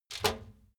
trash basket metal hit rattle high

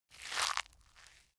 crunchy soft

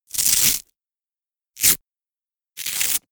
scratch

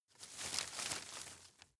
rustle medium

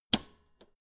old button press jump out

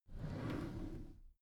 packed drawer smooth open soft halfway

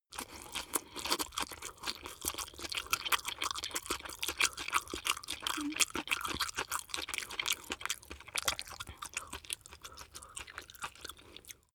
chew spaghetti smack fast

